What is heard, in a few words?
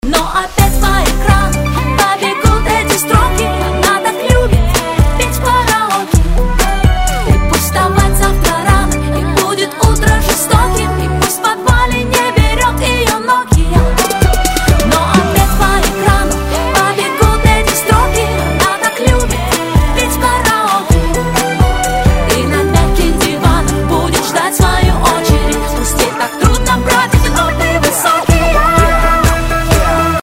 karaoke